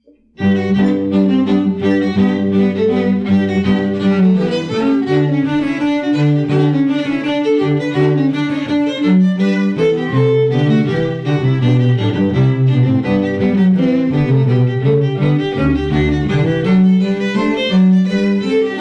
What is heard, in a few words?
Strings; Brandenburg; Caprice Strings